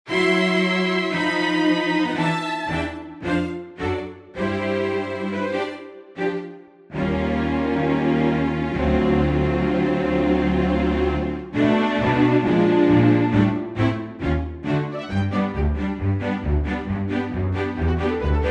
strings G

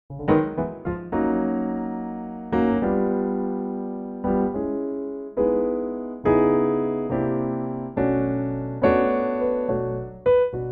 A piano

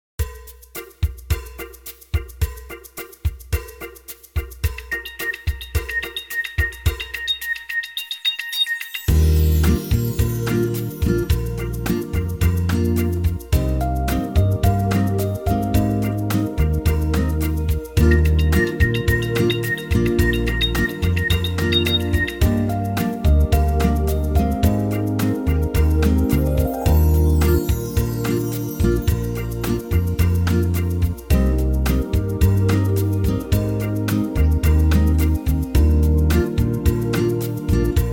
Bossa Eb

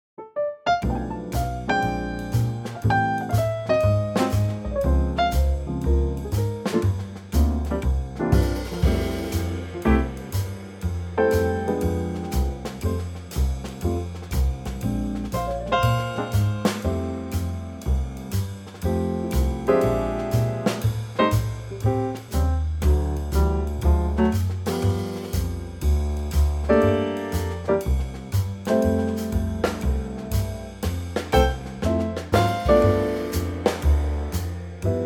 F Trio

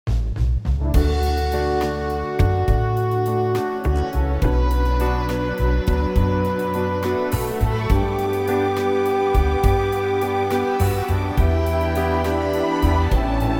D orchestral

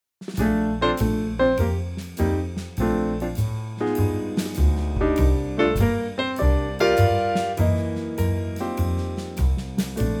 Bb Trio

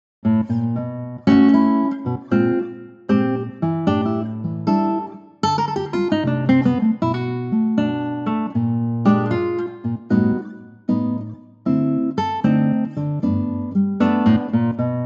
G Acoustic Guitar, female

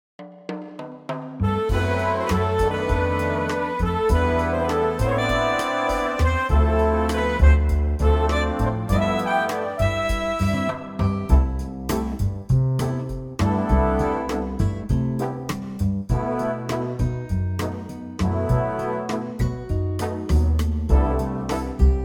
Big Band C

